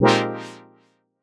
stab dumb F, Noise; stabs

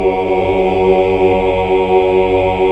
HAL OOH OOOH Oct; Sound & Vision - Gigapack II CD 1 (Roland)

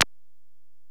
EPerc, Alesis DM-PRO

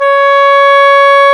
oboe, Roland Orchestral Winds

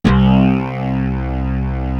POWER BAS; East West; Global Sounds; ORGANS

